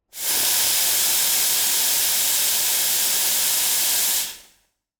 steam, Bar and Coffee Shop; SpillAudio

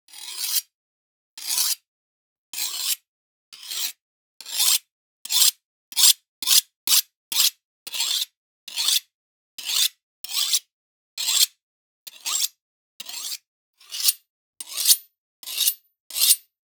KITCHEN knife sharpening

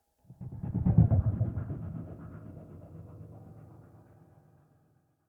Deep Echo Passby, Transitions; L.A. Sounds

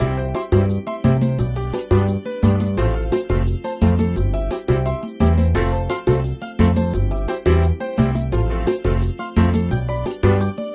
Night at the Beach, Music Loops; Kenney's Sound Pack

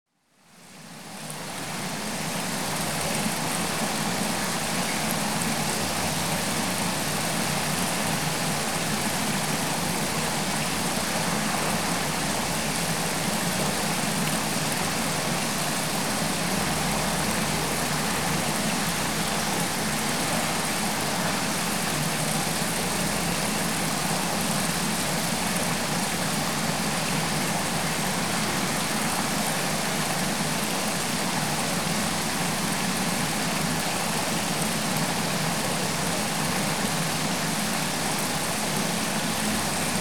Fish Farm; Rivers Streams Water; BBC Sound Effects Library

